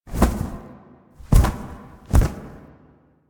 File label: Owl Finisher Stop Success; Duviri; Animals